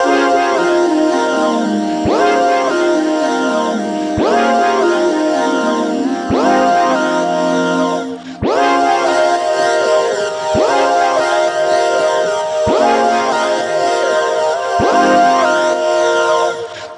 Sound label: 113 melodic stack vocoder Dbmaj; mlove